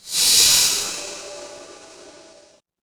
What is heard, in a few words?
mechanical machine